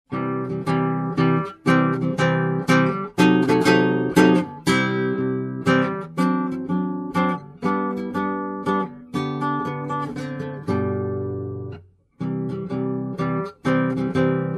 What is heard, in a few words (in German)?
Walzer; Carulli